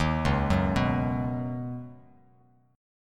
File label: C sharp 7th Suspended 2nd Suspended 4th C sharp Bass Standard; arpeggiated; chord